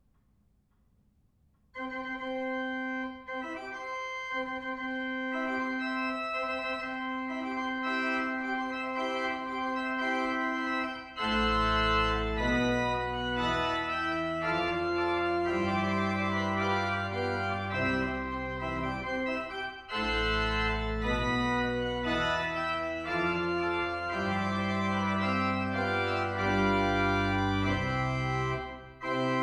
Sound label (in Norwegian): brudemarsj, kirke; mendelssohn; buvik kirke; skaun; brudemarsjer